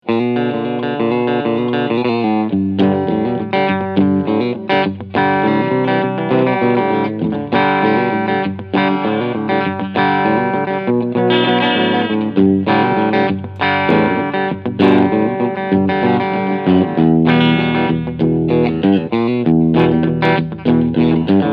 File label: GL Tribute Asat Classic Blues CAR Cleansound Steg PU